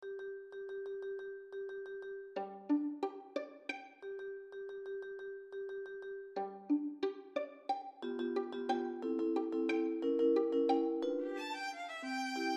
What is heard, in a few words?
Suite Francaise valse musette